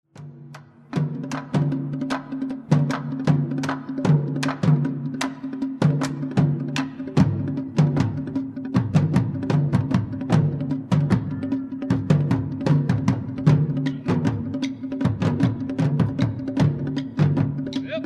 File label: taiko; instrumentos tradicionales